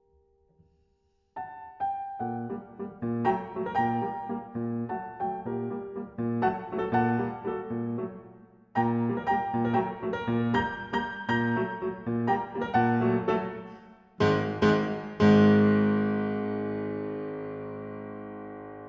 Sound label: Tempo di valse Poco vivace A l orgue de Barbarie